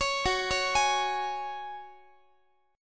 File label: Gb Suspended 2nd, Guitar; Gbsus2 arpeggiated; x,x,16,16,17,19